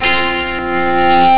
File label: e guitar up; Amiga Soundtracker sample